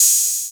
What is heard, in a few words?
Sequencing Cymbal; Kit; Feel; MMFeedbacks; SequencingDemo